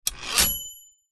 typewriter carriage return margin bell vintage 1960 lemair helvetia